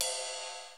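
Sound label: RIDE, Kawai r-50; Drums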